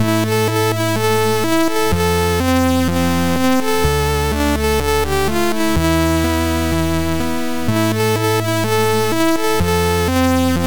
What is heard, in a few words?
music; Atari